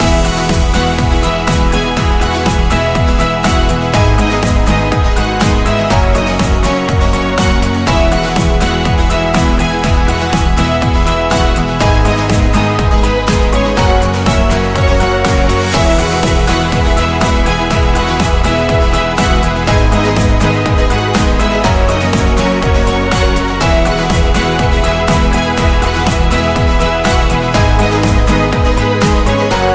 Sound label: Motivational Loop Corporate